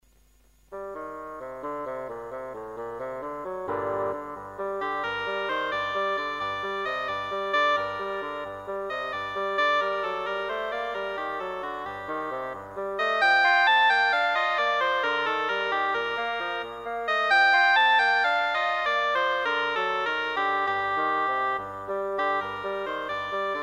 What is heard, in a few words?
oboe bassoon; stereo; cantiga 141 de alfonso x el sabio